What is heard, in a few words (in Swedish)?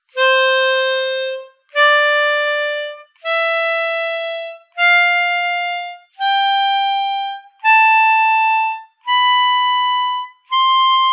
c-dur skala, Bluesmunspel; Kort Munspelslektion